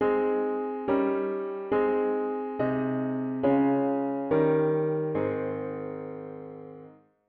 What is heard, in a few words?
Harm Dict diat inv V7 inv bass